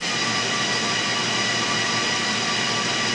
Aircrafts Engines DB605 Starter Reduct; DCS

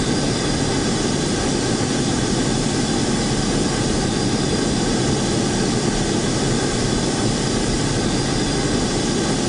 Aircrafts Engines Mig29 In Engine; DCS

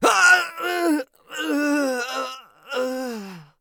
agony; Escape from Tarkov; scav3

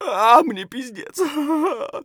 hurt neardeath; Escape from Tarkov

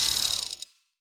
Weapons minigun dry fire end; DCS

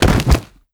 ground fall; Rust

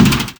gear hard touchdown, aircraft; DCS

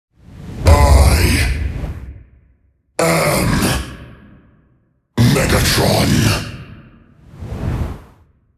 ambient port; World Of Warships; port3d

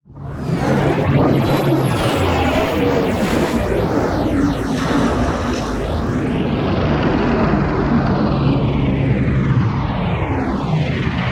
damage vehicle; World Of Warships

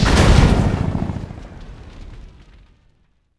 gunship die; Civ4

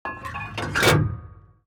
gun reload; World of Tanks

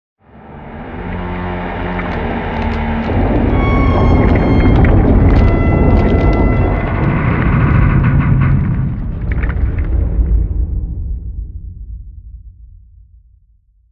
damage vehicle; World Of Warships

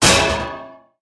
junk fall; guns; tanks; War Thunder

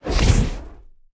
depth bomb; World Of Warships

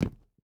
footstep rubber boots concrete jump land; Rust

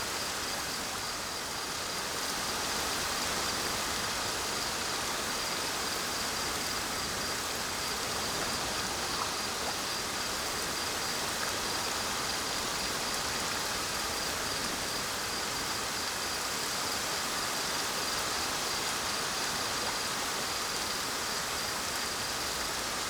ambience forest night calm rainy; Rust

